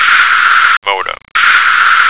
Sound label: modem